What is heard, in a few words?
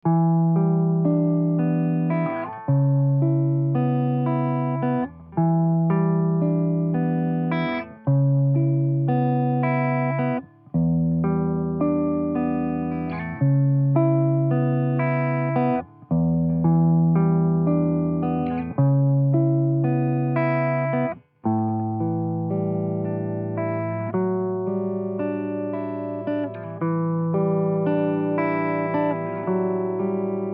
Five Four Vinyl Blues